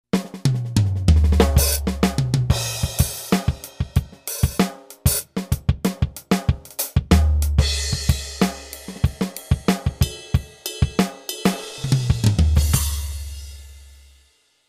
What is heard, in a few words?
kit